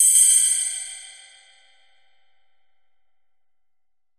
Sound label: recovery